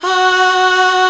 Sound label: choir F; Best Service ProSamples vol.55 - Retro Sampler; AKAI